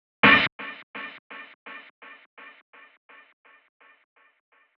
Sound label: 126BPM Cutted; Vengeance Samples; Inception Kit